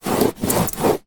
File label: polystyrene block scrape rub hand; foley; zapsplat